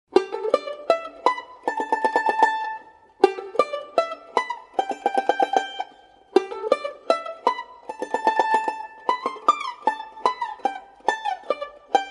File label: banjo